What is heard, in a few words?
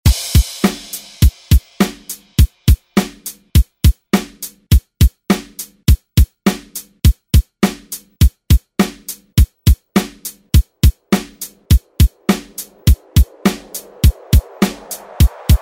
Re Drum; DJ Mhark